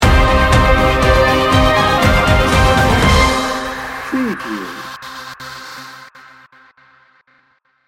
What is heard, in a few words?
warrior march sting bumper